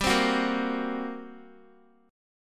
G Minor Major 7th Double Flat 5th, G, down, Guitar, 4F 4A 5C 5G 5C 6E; strummed; JGuitar